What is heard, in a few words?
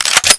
xm1014 insert shell, weapons